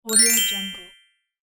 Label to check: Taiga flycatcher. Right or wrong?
Wrong